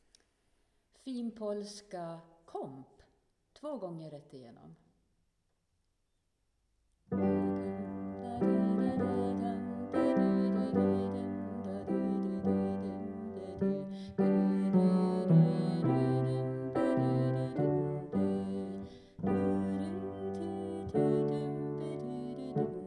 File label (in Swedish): finpolska komp; alla kan sjunga koren vi sjunger i höst